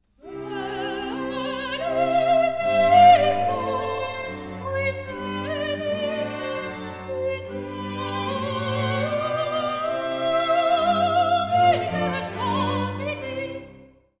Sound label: Benedictus Soprano